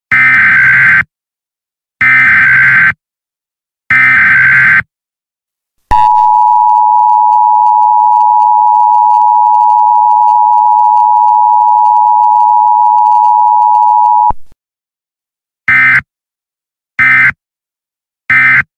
Emergency Alert System EAS Tones Attention Signals